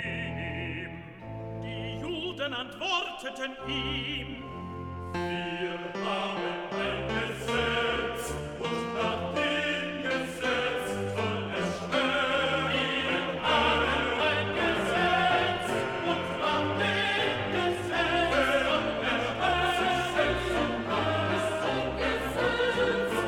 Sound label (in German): BASS, Stimmen mit Klavier; Johannes Passion